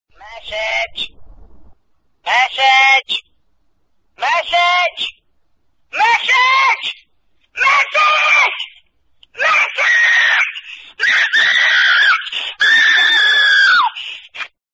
loud message scream funny tone ringtone, sms ringtones